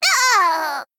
Female Gnome Death

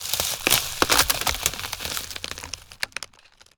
Treant Medium Play Underlay

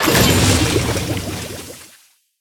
Witchs Cauldron Attack

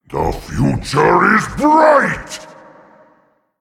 Male Titan Attack

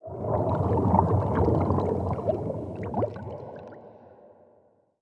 Underwater Play Underlay

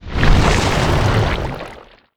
Tentacle Attack; Ozumats Tentacle

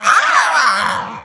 Direhorn Hatchling

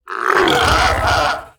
Big Ol Whelp Death

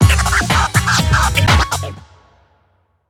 Boombox Attack Sound

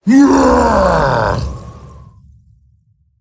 Attack

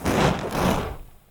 Candle Play

